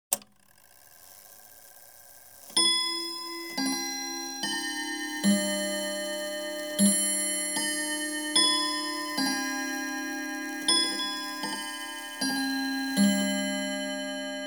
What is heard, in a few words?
Domestic Chiming Clock - 3-4 hour chime; Household; BBC Sound Effects Library